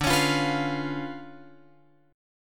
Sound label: D Minor Major 13th